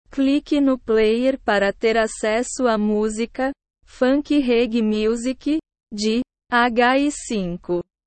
funky reggae music

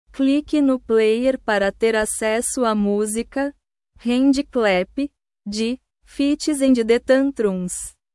handclap

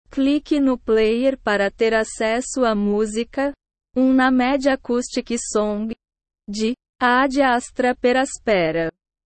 unnamed acoustic song

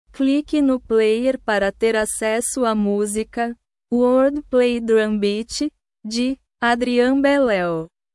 drum beat

